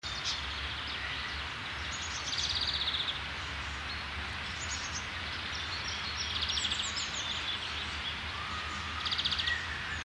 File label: birds